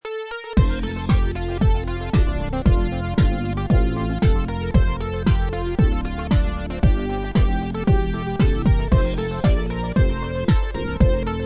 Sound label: House Dance